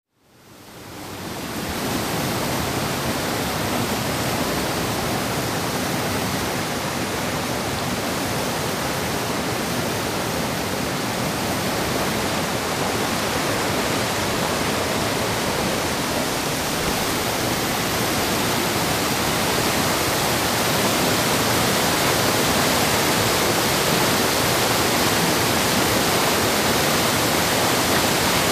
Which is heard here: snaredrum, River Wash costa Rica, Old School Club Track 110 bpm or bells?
River Wash costa Rica